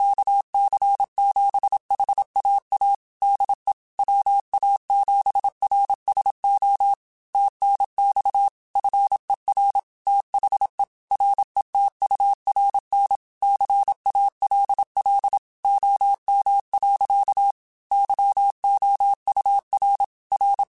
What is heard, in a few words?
Morse Code, QSOs